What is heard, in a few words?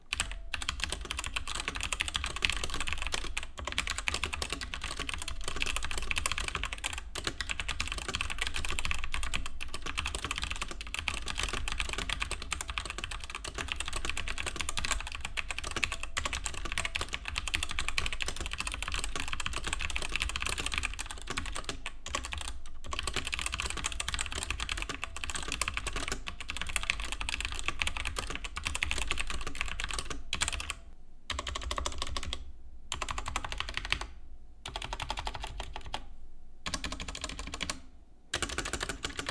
Savio Rampage soundtest